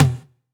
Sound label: Tom Halted, 909 Toms; Slate; Vintage Drum Machines Volume 3 909 Edition